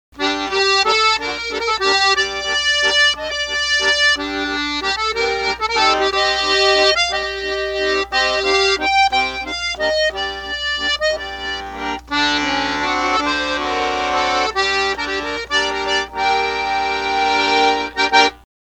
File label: Musette, accordion